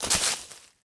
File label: leaves land; Golden Crayon Sounds